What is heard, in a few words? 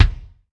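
Kick Room Low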